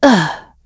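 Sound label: Vox Landing, English; Isolet; kings raid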